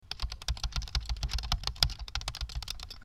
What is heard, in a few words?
Typing on keyboard